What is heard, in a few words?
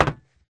Grunt Mvmt FS Walk Solid Metal; Titanfall 2